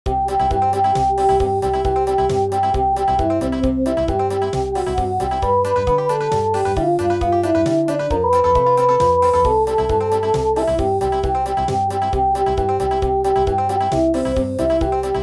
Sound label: Country, midi; Hail To Britannia